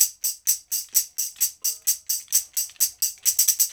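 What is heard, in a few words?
TAMB, tambourine; Drumdrops In Dub VOL-1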